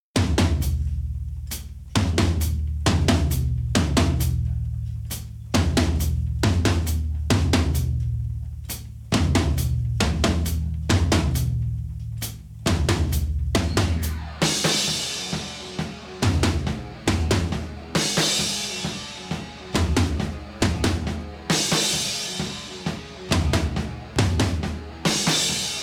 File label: drums